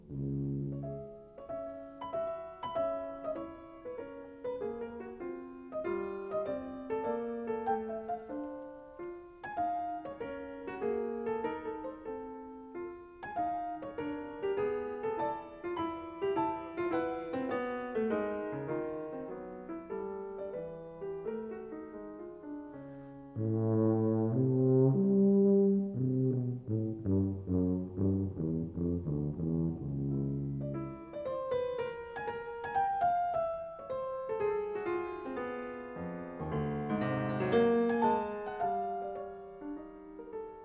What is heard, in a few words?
Sonata for Bass Tuba and Piano, Scherzando; Hindemith; Sonatas for Brass and Piano; 1943, 1955; l'istesso tempo